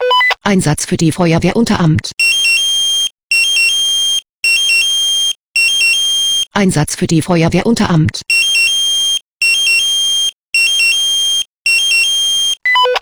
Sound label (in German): SMS Alarmierungstone; FW Unteramt